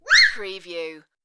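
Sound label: Child Quick Scream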